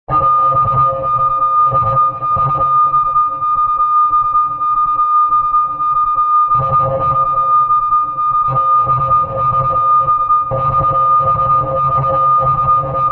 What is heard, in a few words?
Transmission, thriller-horror ambience sound; Scary Soundscape Sound Effects